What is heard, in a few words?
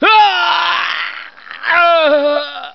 scream guy; Voice; half life